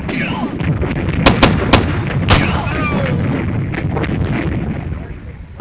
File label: melee